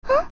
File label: Young Zelda Hmm